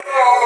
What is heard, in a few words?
F# VOX STAB; funky; Loopmasters; Funky Underground Vol 2